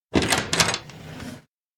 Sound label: door loghouse open; anemoi